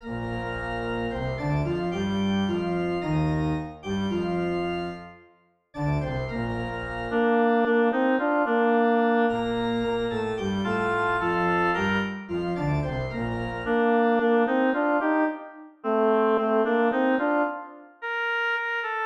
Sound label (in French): melodie